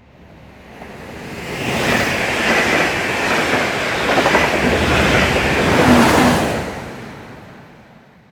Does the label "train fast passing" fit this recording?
Yes